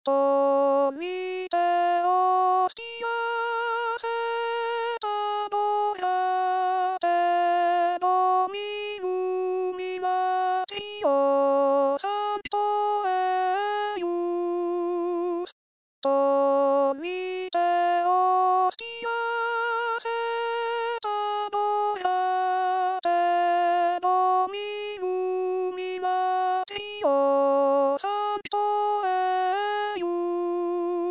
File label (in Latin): tollite hostias alto